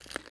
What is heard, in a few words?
Skeleton Footsteps; Playable Tutorial Level